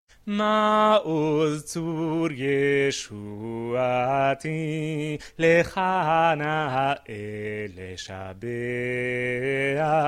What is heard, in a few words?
maozzur bass, choir; Maoz Tzur